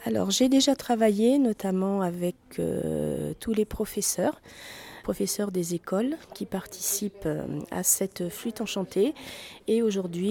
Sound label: FLUTE PAD